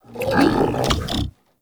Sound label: Infested Shotgun Idle; Weapons